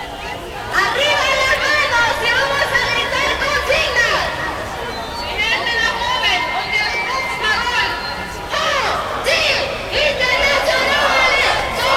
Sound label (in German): revolution parole; best service Blue Box